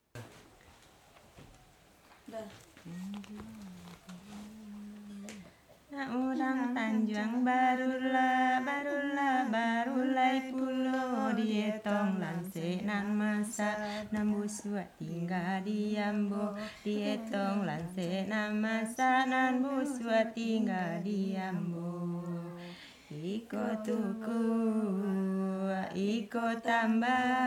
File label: vocal